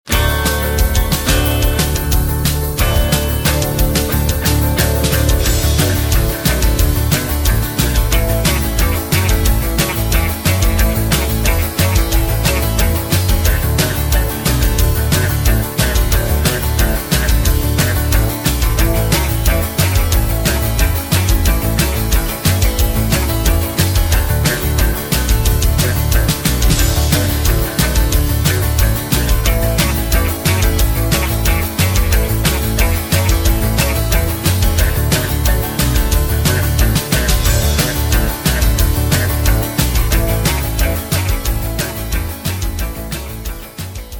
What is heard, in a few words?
D No Backing Vocals, backing tracks